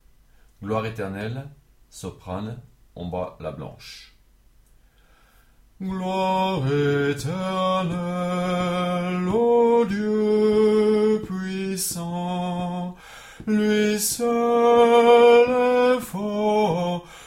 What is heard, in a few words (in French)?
Gloire Eternelle Soprane